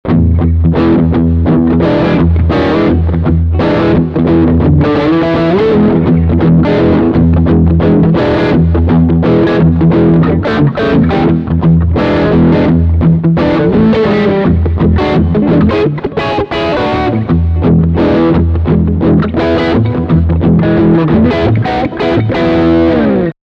Crunch Rhythm beide Pickups

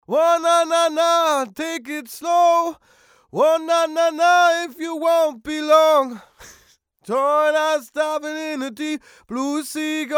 Out Of Tune korrigiert uebertrieben

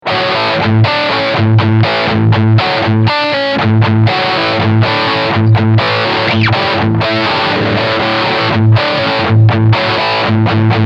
Riff Steg Humbucker

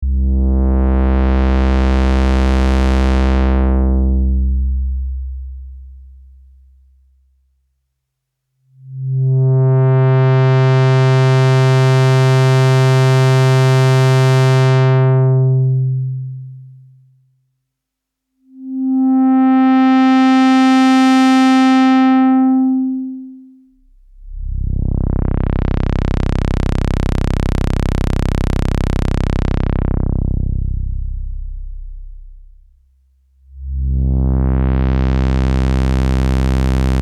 Malekko Manther Filter Sweeps ohne Resonanz

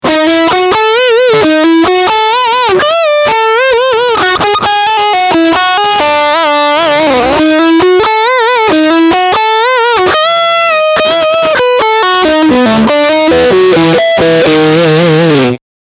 Lick Mitte Steg

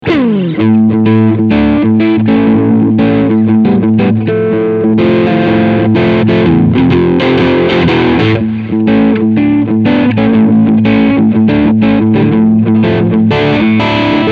Crunch beide SC

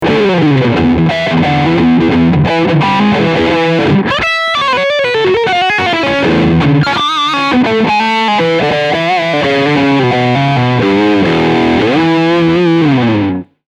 Steg Overdrive I

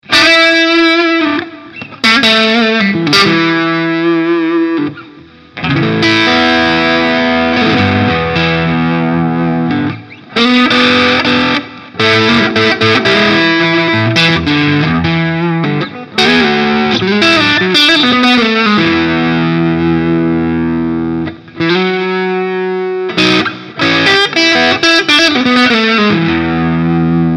overhive gain 12.00 bienchen unten Wabe unten hals strat blues

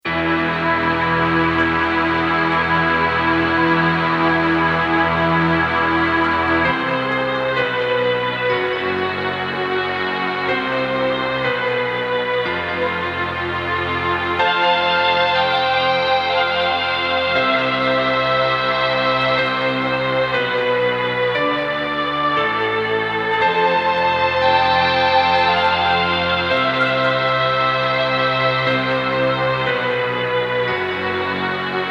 Roland SDE 2000 Flanger im Feedback Loop

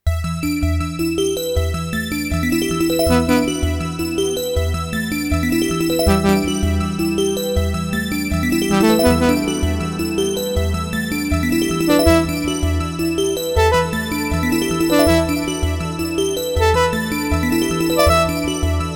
Roland Gaia2 Lead Sequenz

